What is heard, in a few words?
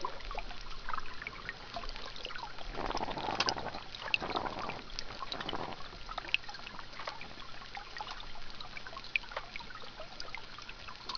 groan, fish groan type a